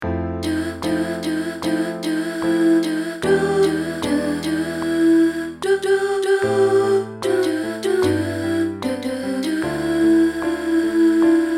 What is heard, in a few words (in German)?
Übergang Sopr